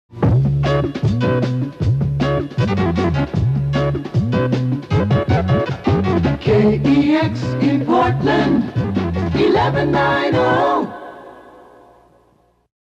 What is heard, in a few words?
Moog ID; KEX